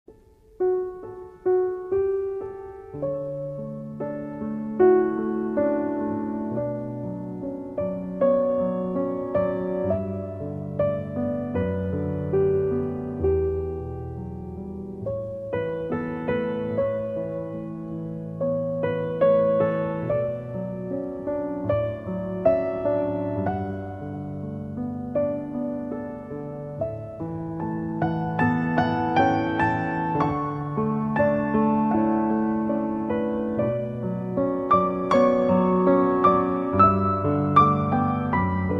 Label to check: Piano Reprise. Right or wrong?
Right